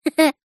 child boy 6 years laugh short; zapsplat

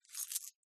Coins Set Down On Glass; PMSFX

